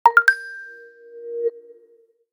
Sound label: Default Notification; Nokia; X